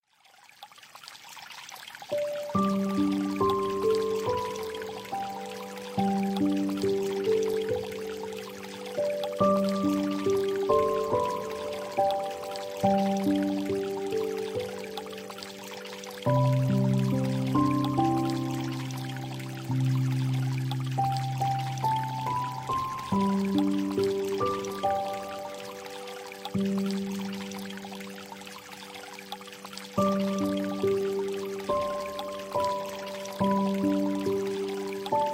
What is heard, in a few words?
Relaxing Piano Music Sleep Water Sounds